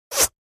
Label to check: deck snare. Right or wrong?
Wrong